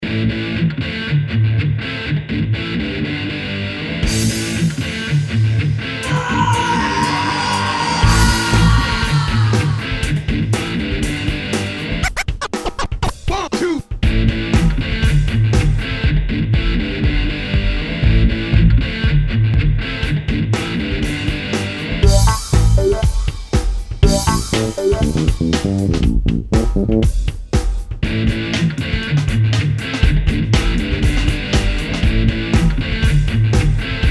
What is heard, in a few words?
Guitar; Pete Ippel; Upbeat jam